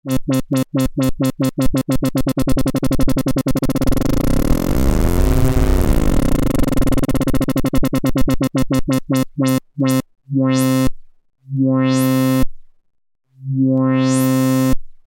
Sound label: lfo filter saw, synthesizer basics